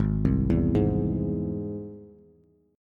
Ab Augmented Ab Bass; Ab+ arpeggiated; JGuitar

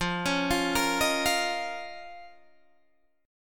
F 7th Suspended 4th Sharp 5th F, Guitar, DADGAD

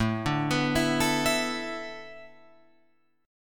A Suspended 2nd Suspended 4th, Guitar, Open F; Asus2sus4 arpeggiated

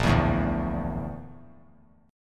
A sharp Major 7th Flat 5th, chord; strummed; 3C,3F,3A,3D; JGuitar

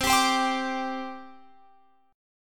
C Suspended 2nd, C, down, Guitar, Open F; Csus2; strummed

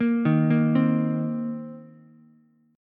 C Minor 7th C Ukulele; Cm7 arpeggiated; JGuitar

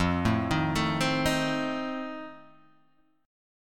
F 7th F down Guitar Open G

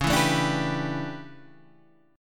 A 11th Db, guitar chord

